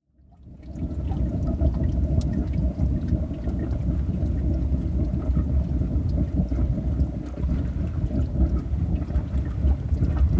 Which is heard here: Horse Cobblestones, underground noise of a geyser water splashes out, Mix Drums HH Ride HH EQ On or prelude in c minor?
underground noise of a geyser water splashes out